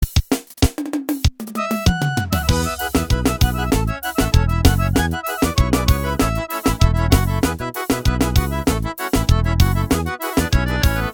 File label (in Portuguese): ritmos para teclados vaneirao